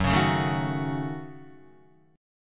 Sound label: F sharp Minor 6th F sharp Guitar; F#m6 strummed